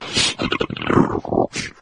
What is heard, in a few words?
death, flood